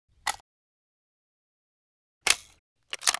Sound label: thompson reload, weapons foley; Spearhead Demo; MacFormat 2003 June